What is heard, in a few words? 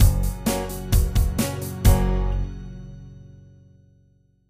Beat ident; Music Loops; Idents